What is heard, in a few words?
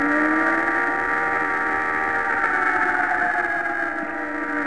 alarm